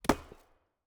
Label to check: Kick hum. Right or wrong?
Wrong